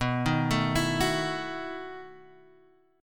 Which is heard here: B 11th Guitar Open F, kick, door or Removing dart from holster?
B 11th Guitar Open F